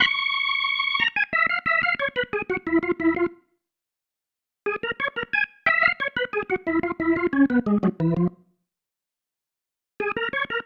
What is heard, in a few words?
Pattern, Organ